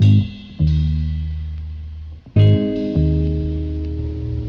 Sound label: bass bumpy ride; Loops; Phonky